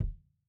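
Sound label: KICK LOW LOW KICK, analog recorded drum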